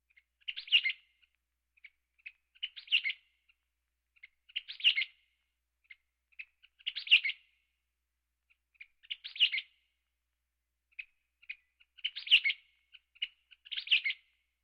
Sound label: Birds